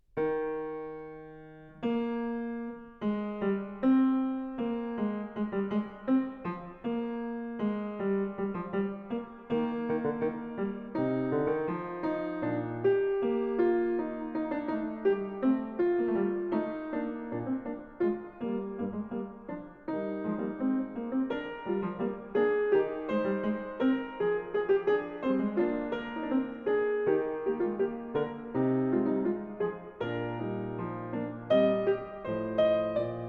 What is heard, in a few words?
Fugue, E flat major; BWV876; Bach; Well-Tempered Clavier; Book 2